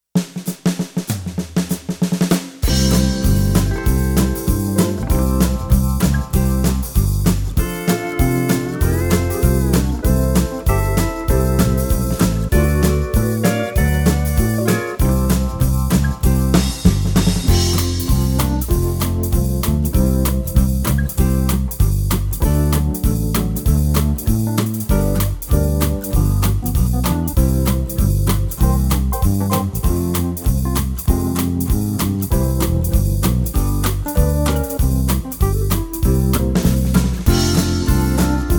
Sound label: WESTERN SWING